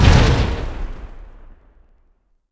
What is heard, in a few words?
Tank Hit; Civ4 beyond sword